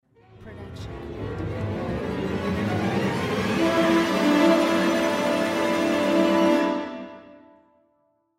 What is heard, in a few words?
rising strings and horn